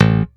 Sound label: A0 SHORT, STIK BASS; D-STIK BASS1